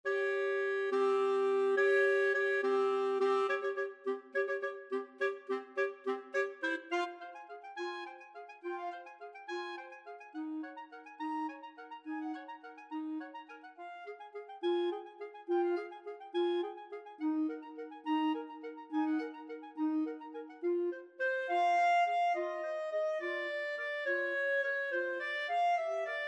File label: clarinet duet